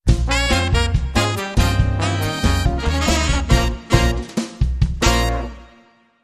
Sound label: Latin Brass, Jingles